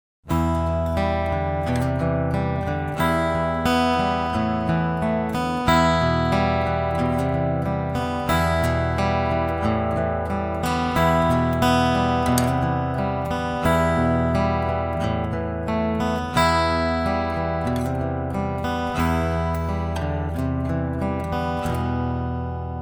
Chorus

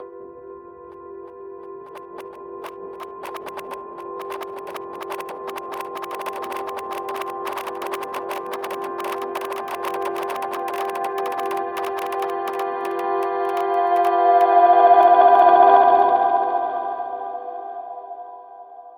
Scenic Hybrid Instrument Crackle; Reason Studios Reason 11

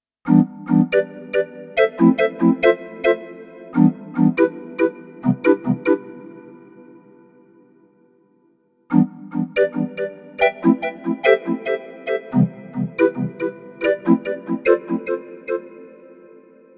Nord Piano 5 Organ Ping Pong Delay

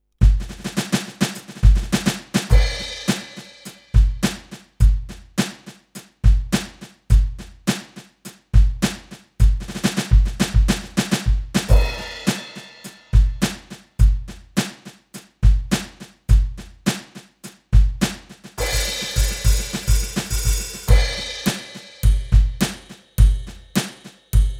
Brushes

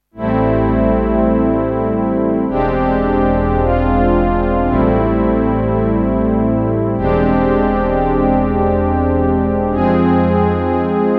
TX7 Strings

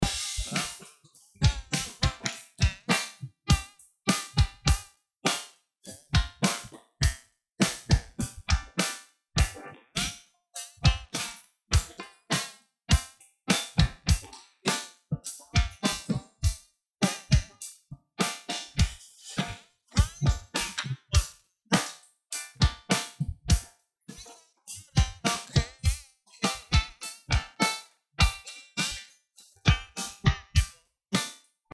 Bar Drums; Magix Acid Pro 10 Stem

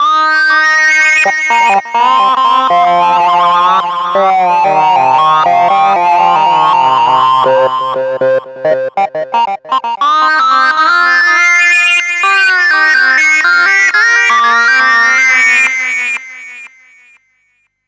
Sync Lead Stereo Delay

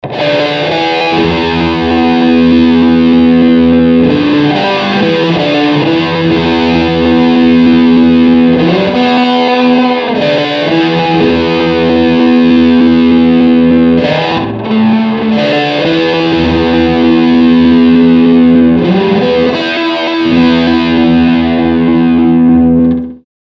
Soundscape

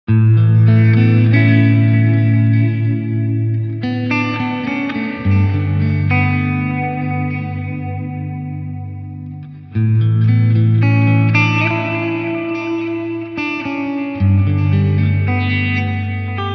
Line6 DL4 MKII Delay MULTI PASS

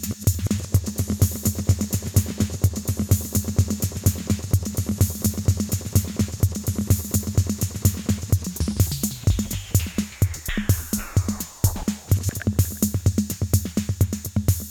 Strymon Magneto Drums Triggered Tap

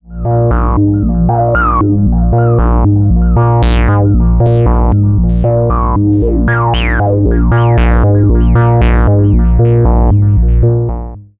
Behringer Space FX Moog Werkstatt Delay

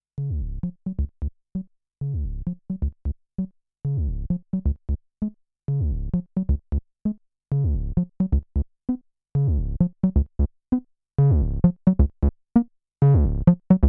x0xb0x Square

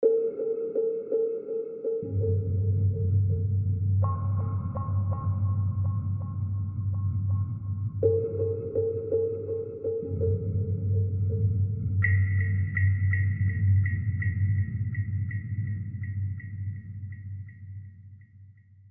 Ether droplets

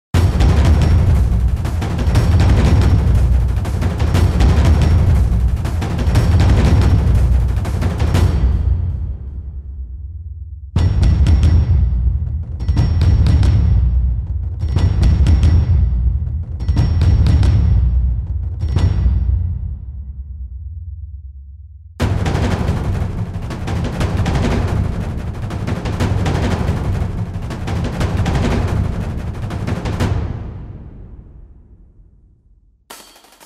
Basic Accents 16th triplet feel All Lo Mid Hi; Rise of the Machines

